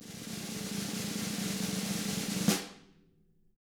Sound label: snare rolls, drums; ILIO; Double Platinum Drums 1